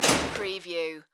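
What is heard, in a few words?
Building Door Open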